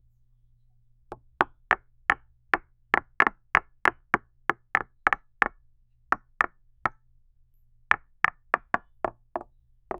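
a singular smooth low pitched, object manipulation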